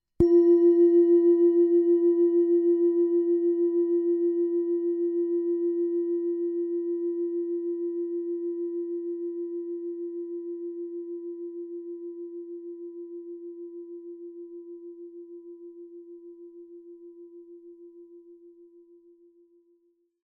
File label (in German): klangschale platonisches jahr 268g